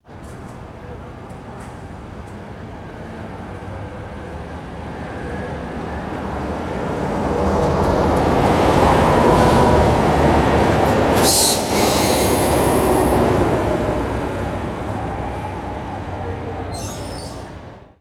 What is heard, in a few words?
smooth electric streetcar passing by; trains